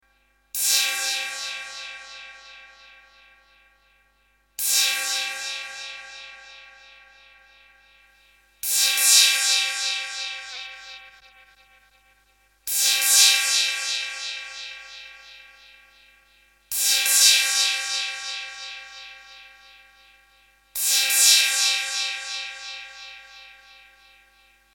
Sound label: roland 808 cymbal; shootout